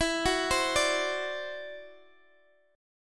E 7th Suspended 2nd Sharp 5th E down Guitar Open D